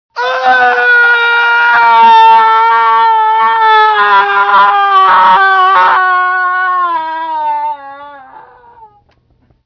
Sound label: scream